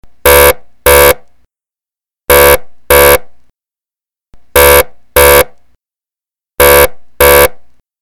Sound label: re-entry alarm